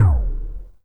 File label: Kick One Shot, Drums